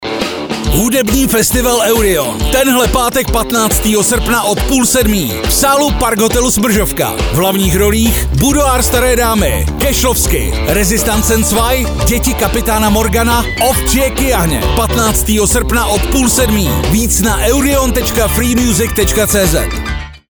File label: eurion beat